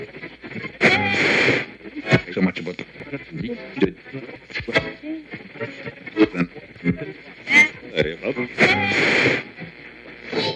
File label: radio